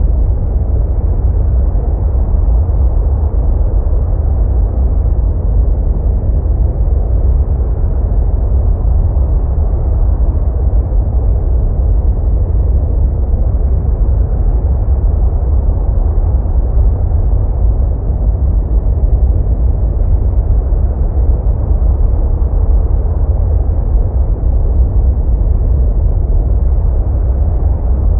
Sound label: Water Vortex Loop; moul-assets